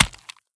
pistol drop gravel; STALKER; IWP

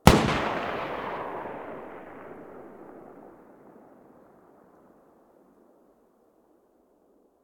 galil muzzle mono far

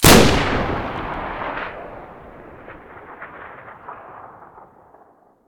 aa12 muzzle mono, weapons; STALKER; IWP

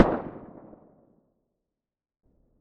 tt33 muzzle mono very far

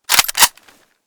sig550 misfire

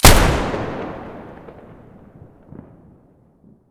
m590 muzzle stereo; mossberg

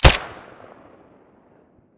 silencer glock17 stereo

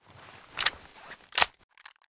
usp45 reload

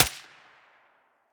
silencer mono distant

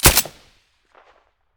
m9a3 silencer stereo; beretta

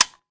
spas12 empty, weapons

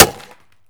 wood, bullet collide; STALKER; IWP

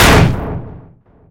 metal crash; STALKER; IWP

